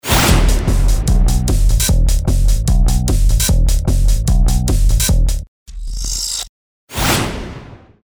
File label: stager beat